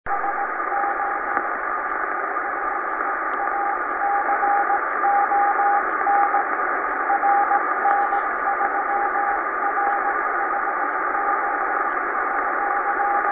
beacon; rn3rho

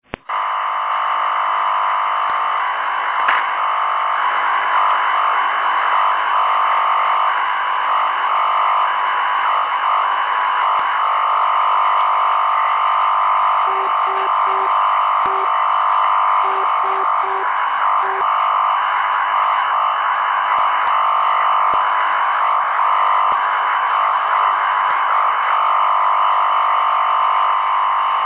488 kHz unid

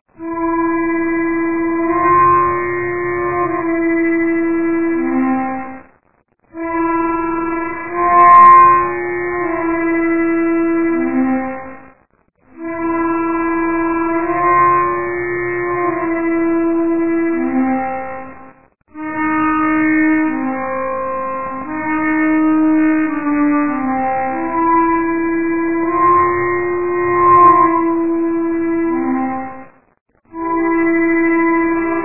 harmonica

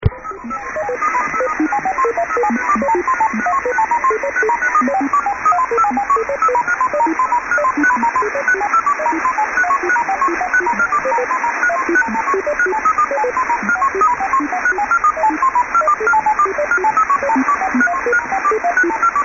melody

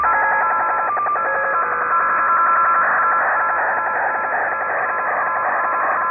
8588 kHz

